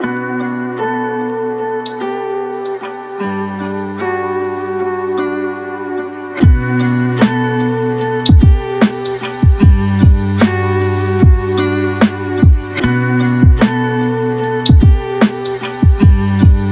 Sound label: Lofi